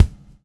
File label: BUBL, Backbeat; Spectrasonics - Backbeat